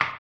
HAMBONE, Vocal Blues Soul; Spectrasonics; Planet

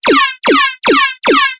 Dubstep Atonal Pacer FX

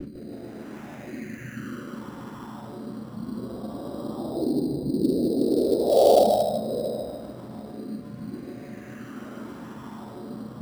GRIT BELLS

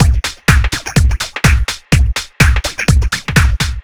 Full, Drum Loops 125bpm, Nu Dance Electro; Blip Jam

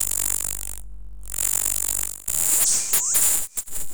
loop, techno atmos

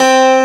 piano; Analogon

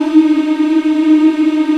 VOX AFMM, VOX Afro Mms, Africa

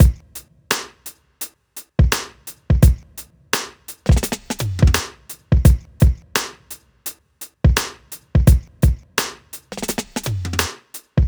Drums, Hip Hop

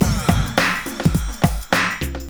BEAT, Hip Hop, 102-105bpm; E-MU Formula 4000 Series Vol. 1; Hip Hop Nation

